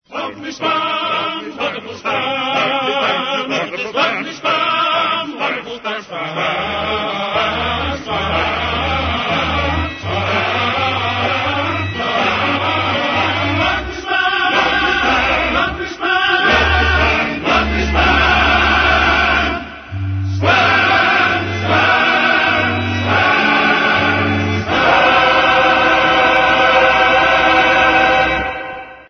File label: Spam ringtone; monty python